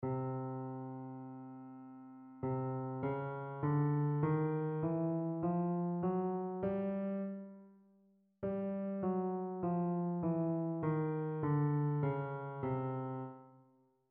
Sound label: C Chromatic Bass Clef; HIGH SCHOOL CHOIR; BERGEN COUNTY